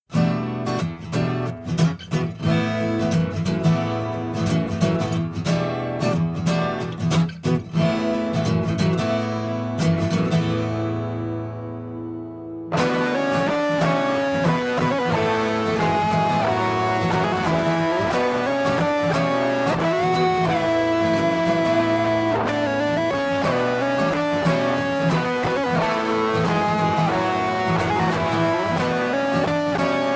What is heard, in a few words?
Guitar Isolation